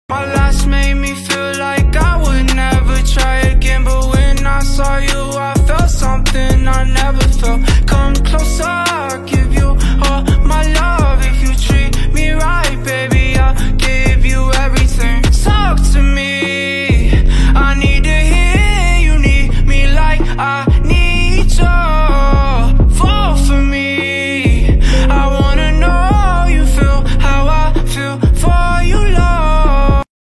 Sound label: Falling Ringtone